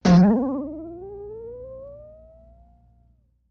Bing, metallic, insecty, vibrato up; bings

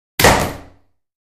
Ping Pong Paddle Thr

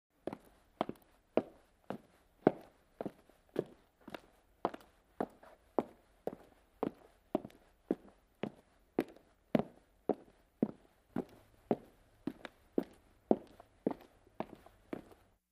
Footsteps On Wood

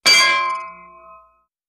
Ding, metallic hit; chimes & bells

